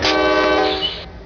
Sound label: klaxon, Train; P42DC x2 11 Car Superliner Long Distance